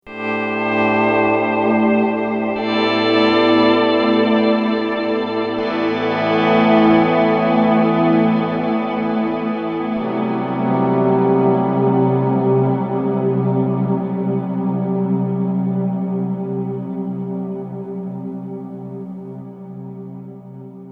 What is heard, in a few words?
ambient gtr